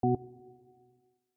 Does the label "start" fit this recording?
Yes